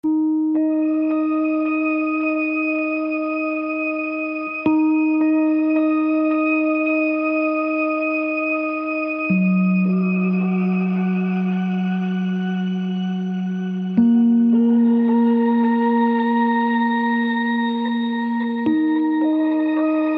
Rhodes; delay

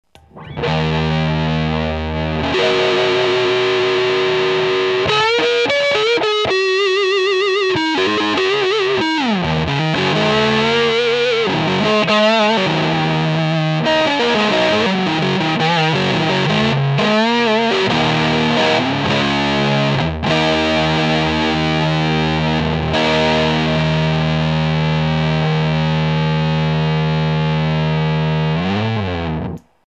After Mod

